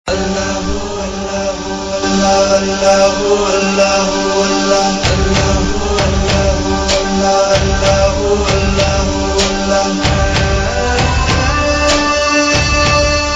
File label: Slow Tune; Zee TV; Jodha Akbar